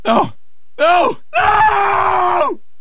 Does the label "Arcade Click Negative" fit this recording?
No